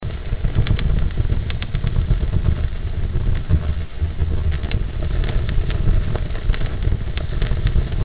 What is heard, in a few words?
fire